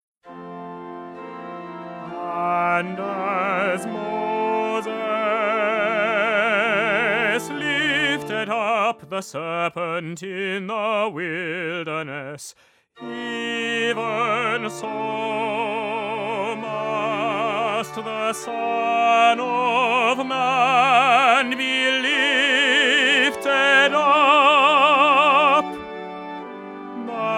Concert Version

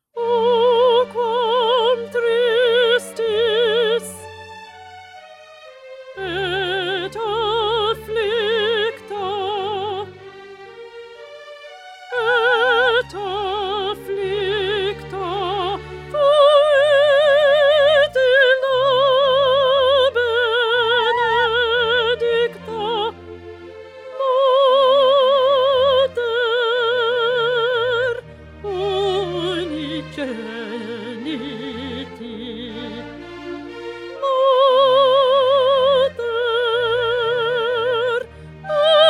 Alto; Pergolesi; Stabat Mater

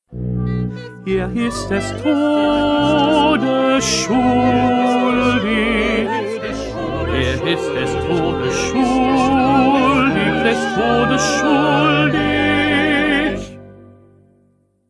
1st Tenor

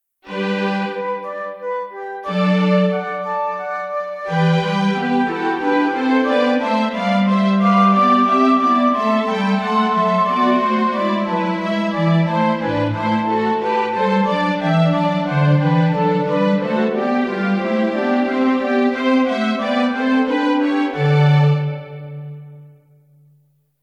Instrumental

